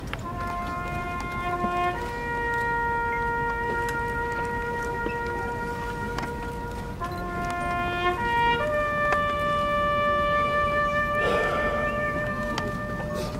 Bugle Taps At The Tomb Of The Unknown Soldiers Memorial